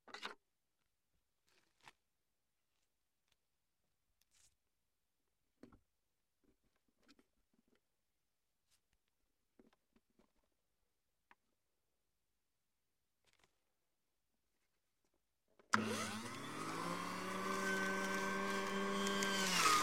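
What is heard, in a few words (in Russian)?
звуки блендера и соковыжималки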